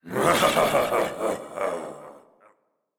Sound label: evil laugh; arcane